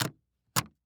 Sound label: Household Suitcase Latch Open Close